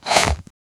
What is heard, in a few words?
sudden hollow skull roar, teeth snap